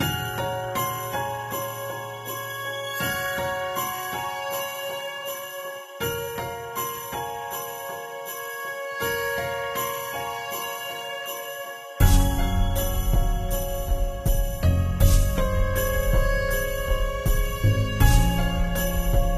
Town; ruu goblin quest

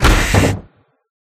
Open; Life of a Naomi

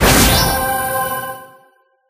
Heal; Life of a Naomi

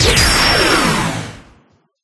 Particles; Life of a Naomi

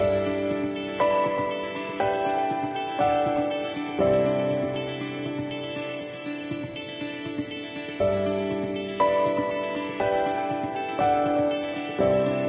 Theme; ruu goblin quest

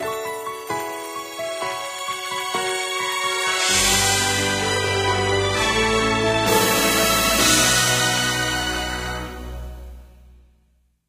Fanfare; Life of a Naomi

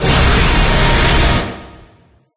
Summon; Life of a Naomi

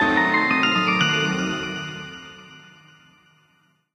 Mystery; Life of a Naomi

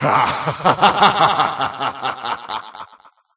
Devil; Life of a Naomi

